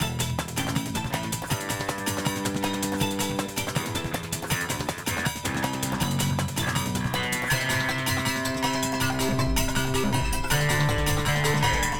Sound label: Action START